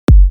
Kick 49Hz G; Heart

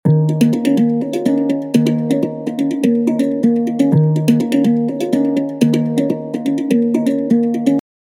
Handpan Loop Dmin 124BPM

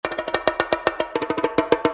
bongo fill sticks 122 wet Dm

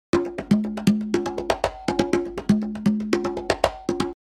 Congas Percussion Loop Processed 124 F

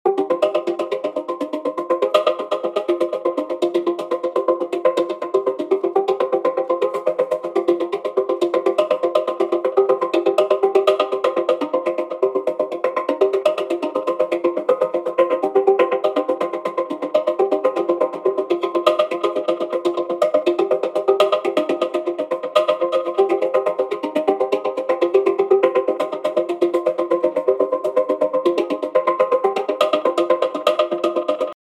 Handpan Modular Fmin

